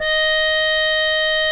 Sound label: D#5, BRASS